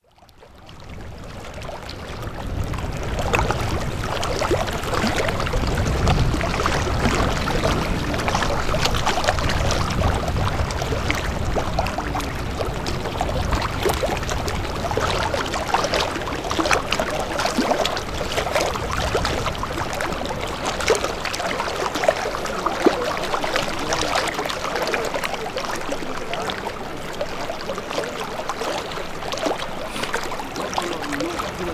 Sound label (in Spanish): agua de la laguna negra, Piura; Laguna Negra, Piura, 2019; Archivos sonoros - grabadora; cartografia sonora peru